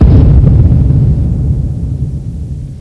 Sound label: stomp; GoldSrc